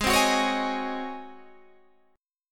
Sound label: Ab 11th Ab Guitar Drop D; Ab11 strummed